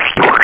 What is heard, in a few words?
SPLOUCH; KillBill